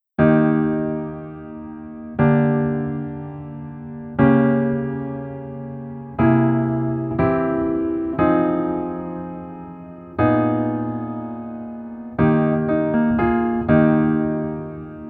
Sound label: Piano ver